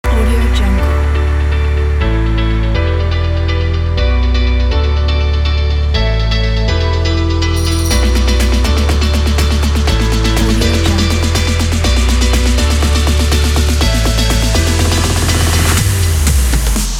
fun summer dance party

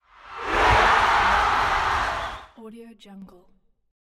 stadium crowd cheering on goal

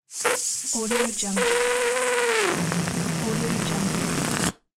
cartoon balloon fart

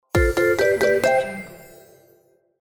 sport arcade win sound

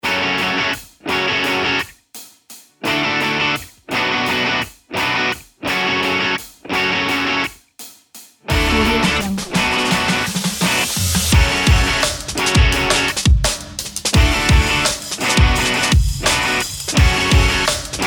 rock energetic loop

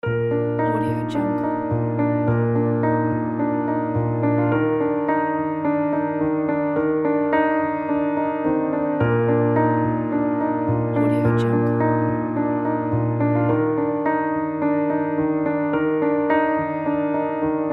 atmospheric inspiring piano

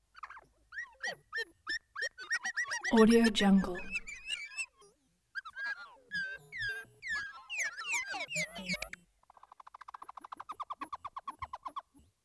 creature vocal harmonic bleeps and blurts

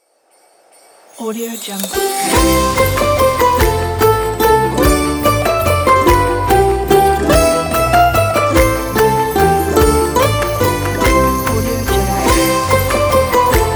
we wish you a merry christmas

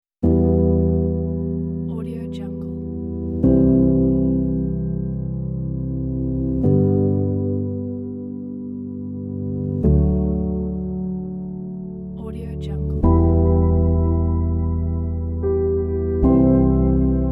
sad piano

